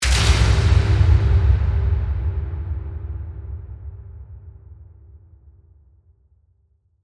Hit Heavy Metal, Hit, Heavy, Metal, Reverb, Accent, Transition; Production Element, Imaging Element; BlastWave